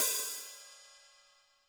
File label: HiHat & Crash, Roland TD-20; HiHats